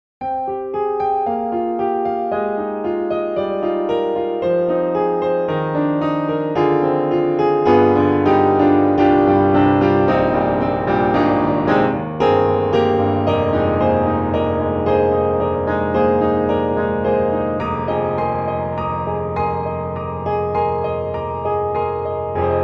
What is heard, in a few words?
Pianoteq Grand C2 warm; MODARTT S.A.S.